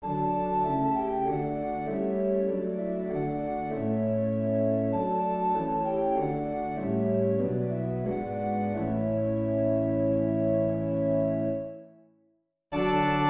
Loves redeeming work is done Savanah 5 verses Organ; Hymnal 1982